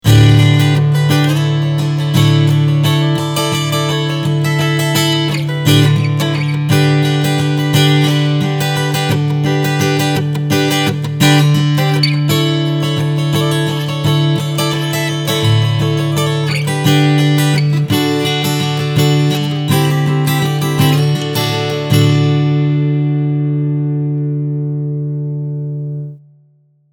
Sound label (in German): Strumming Mikro